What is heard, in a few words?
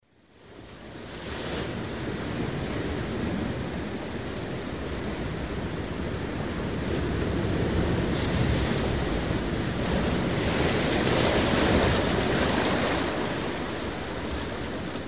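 stormy sea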